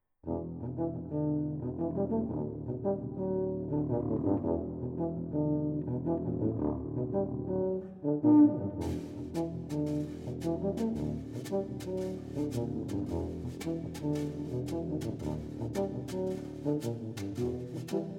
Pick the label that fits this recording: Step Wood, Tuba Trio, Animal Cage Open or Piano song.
Tuba Trio